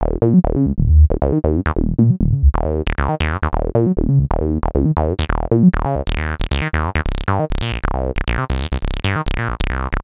Hive Bassline 12 und 24 dB

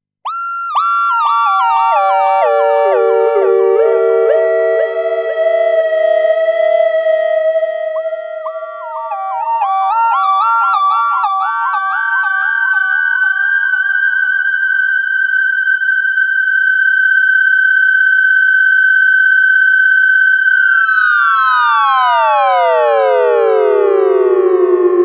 Recorder mit Controller und Echo

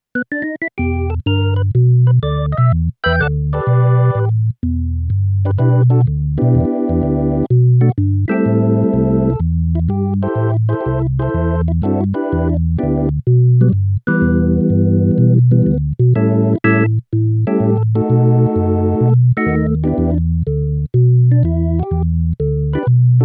Vox Continental Orgel CX 3

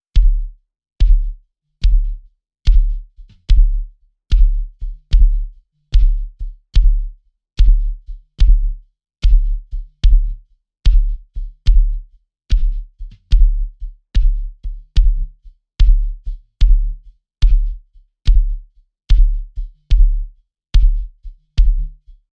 Bass Drum mit Transient Control

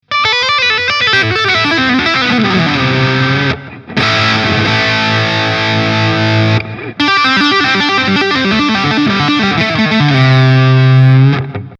EVH Shark Steg Tapping

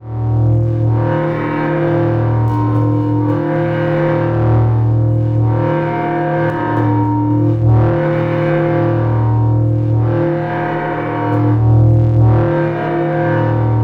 Korg volca modular Drohne

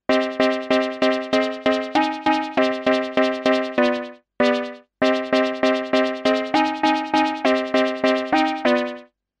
Monopoly Paraphon

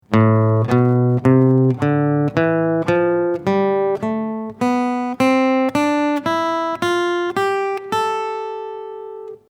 a äolisch

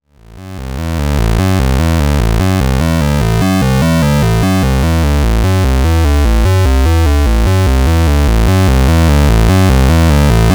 Buchla und Tiptop Audio 245t Moduliert im Audiobereich als VCO

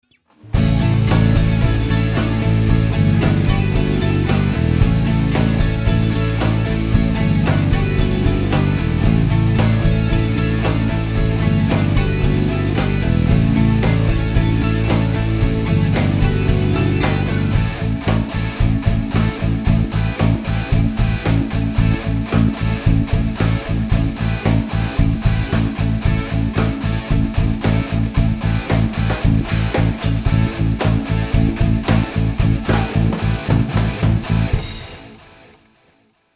Song ohne Drawmer Summe

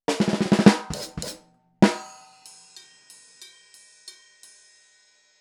Drumset; sE Electronics V2 Switch

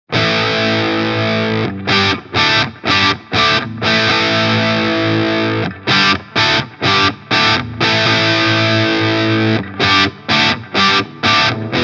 Verzerrt like Riff

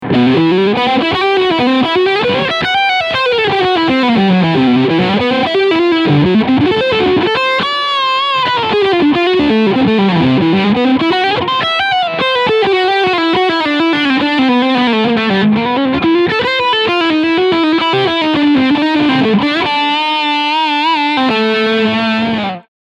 Peavey HP2 Leadsound Steg Pickup

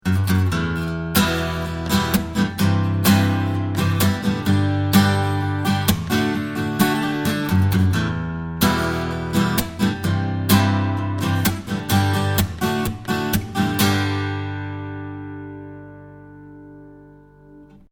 Mikrofon 12 Bund

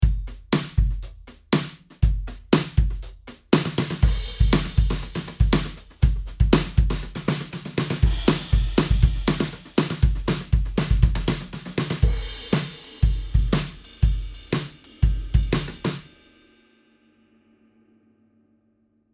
Logic ProX Austin Roots Brush Drummer Besen

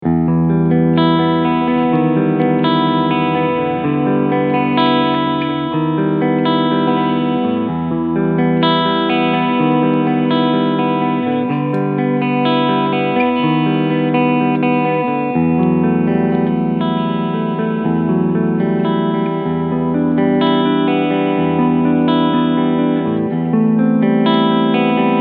SC Custom Plus EMG OCF Clean Sound beide PUs; Harley Benton

